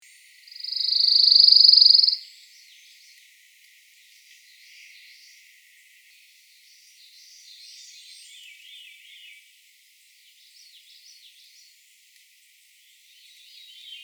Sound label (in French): paruline des pins